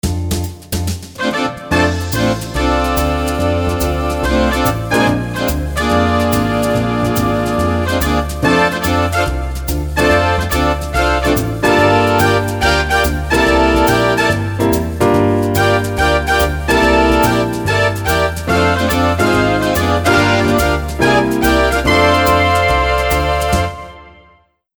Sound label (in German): Brass Besen Chord; Wersi